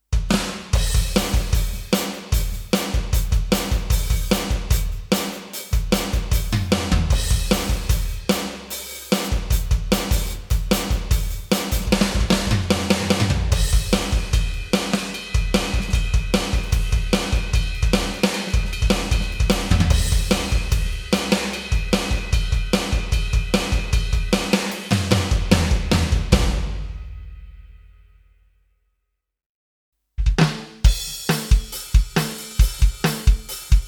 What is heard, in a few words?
rock